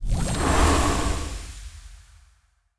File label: Boss Fairy Dragon main